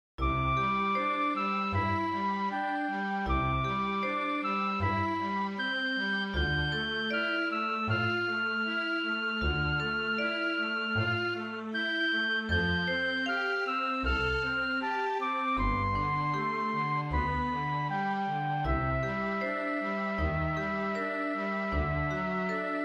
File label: MIDI Town; Star Merc